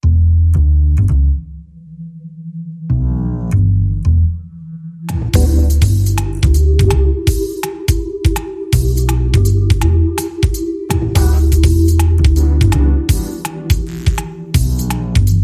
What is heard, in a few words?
Chill Swing